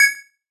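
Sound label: System Ok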